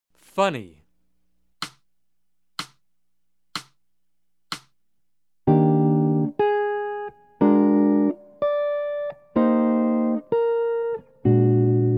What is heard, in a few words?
Jazz; jue shi ji ta